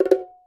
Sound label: battery countdown; Swapperoo; Android iOS mobile Switch Windows